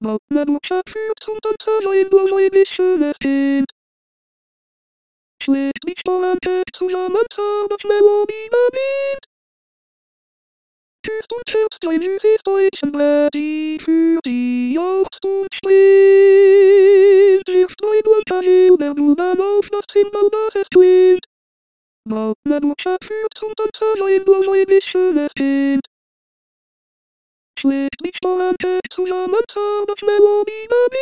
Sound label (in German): Zigeunerlieder alto